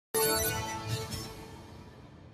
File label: battle result screen; sao